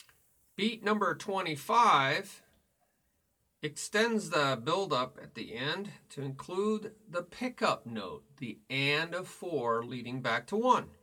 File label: Beat Toom Cha Ti Ba Cha Ba